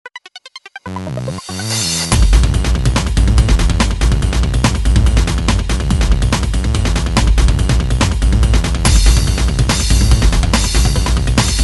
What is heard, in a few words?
drum bass